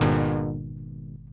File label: Chord Stall; Lost Tapes From Mars